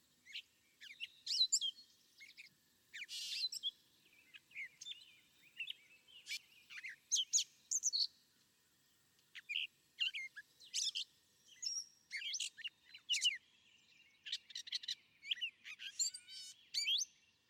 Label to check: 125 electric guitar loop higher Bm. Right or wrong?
Wrong